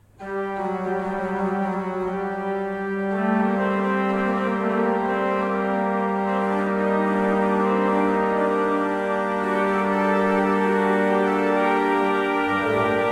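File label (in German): Orgel, pfeifenorgeln; Seifert Kevelaer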